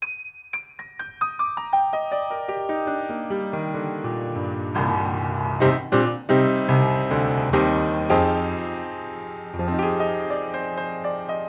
Piano, Inside Card Pair

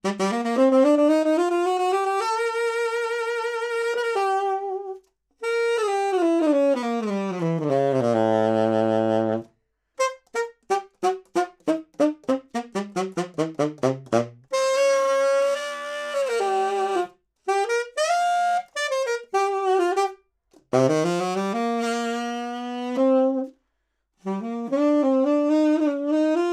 Sax Tenor 50cm R Mid

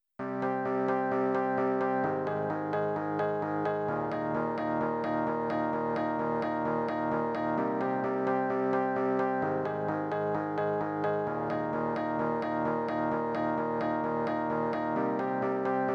Mix Pno Solo

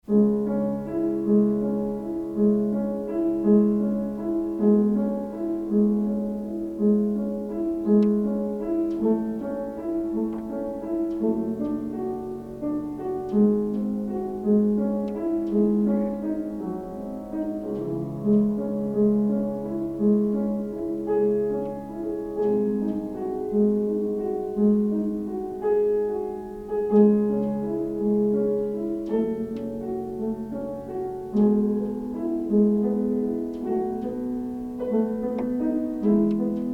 Resonators Piano Double Glazed Window Stereo

